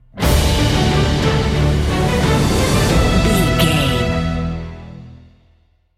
cinematic corporate sting bumper

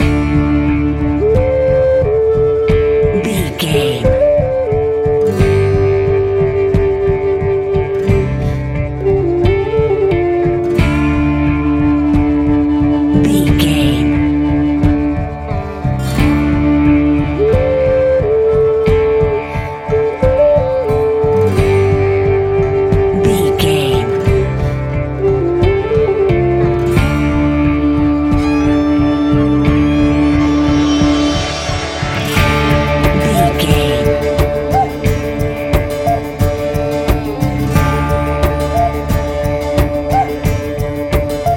native american, 89 bpm loop

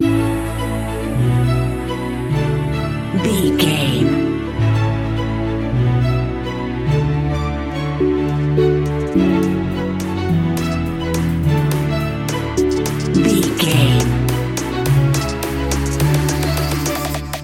uplifting rising

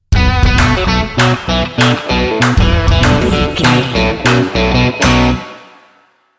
rock machine stinger

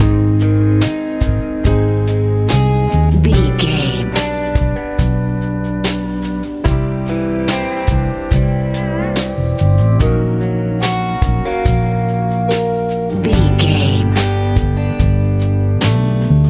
country ballad

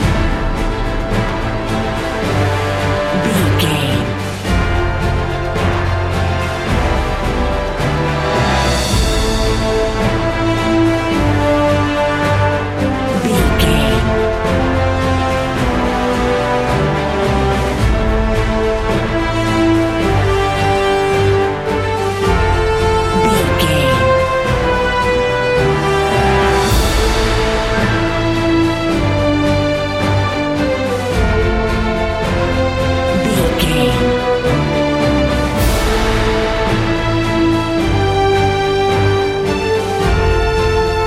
march patriots epic cinematic loop

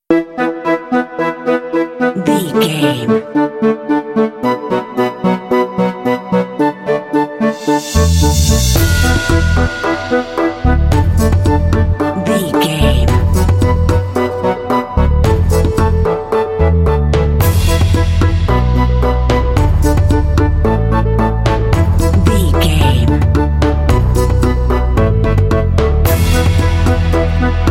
violin tension danceless insts mix

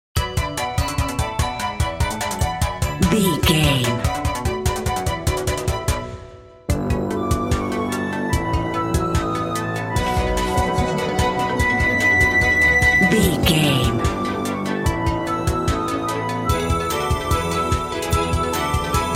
video game title screen

